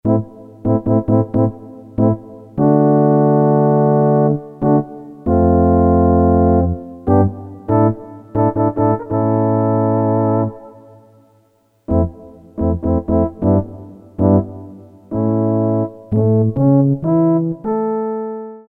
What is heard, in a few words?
French, Korg DSS-1 Brass